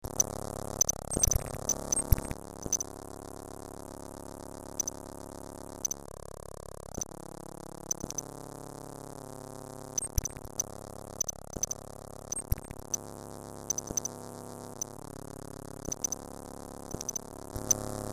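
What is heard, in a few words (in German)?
mehrfachsteckdose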